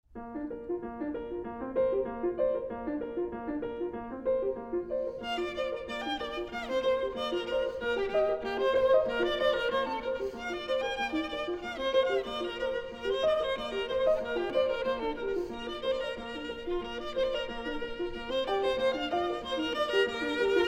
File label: Arr. For Violin and Piano; The Partridge; A. Gabrielian